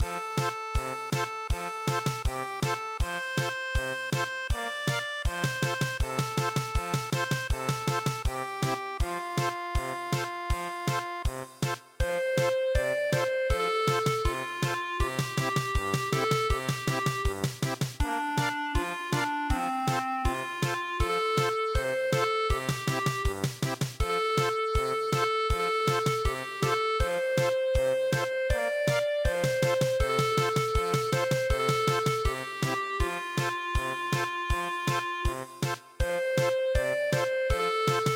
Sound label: Cradle Song German Polka